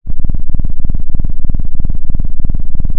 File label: pop, Sound of a cat purring